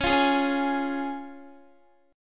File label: Db Major Db Guitar; strummed; x,16,15,13,13,15